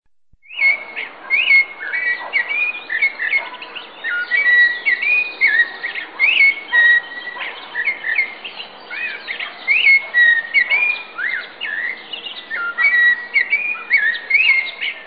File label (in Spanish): voz zorzal colorado